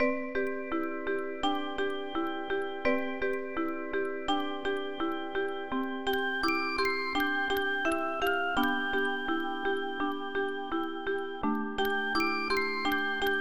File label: organ; Shuho